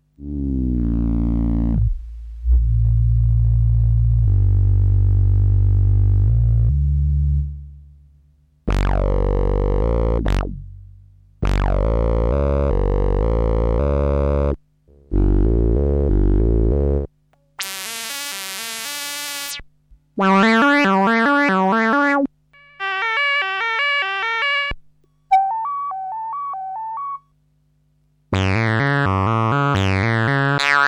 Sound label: kawai 100 p